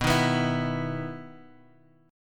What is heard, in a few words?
B Major Add 11th B, chord